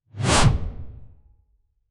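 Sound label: create a nice short whoosh, camera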